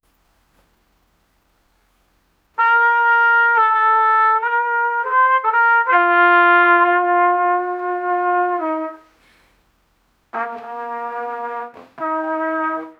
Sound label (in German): trompete spielt einen, christmas